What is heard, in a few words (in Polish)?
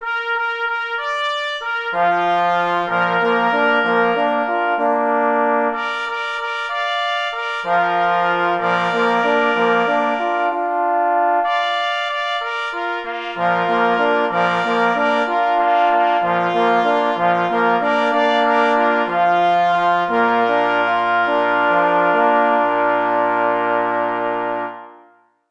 Hejnal na 2 plesy i 2 parforsy; K.E. Kroskowski; Hejnal KL Slonka w Sierakowicach